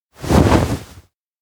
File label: futon jump up; anemoi